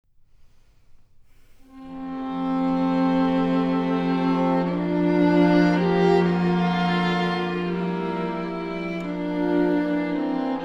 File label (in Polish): Kwartet smyczkowy a-moll, cz. II; F. Mendelssohn